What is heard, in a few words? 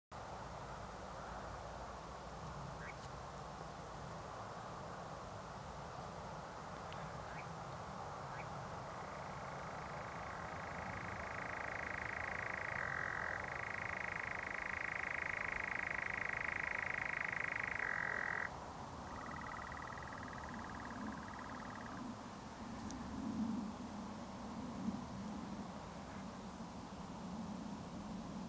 Birds at night; پرندگان در شب